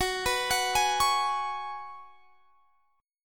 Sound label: F sharp Suspended 2nd Suspended 4th; arpeggiated; x,21,21,23,21,21